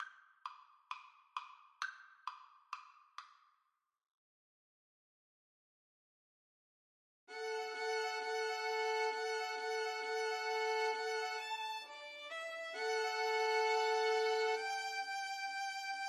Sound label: jingle bells boogie